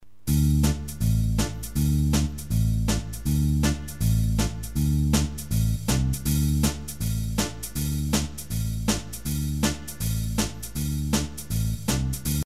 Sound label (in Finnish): Foksi backbeat vaihtobasso